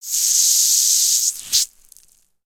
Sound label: Gnarled Torch Water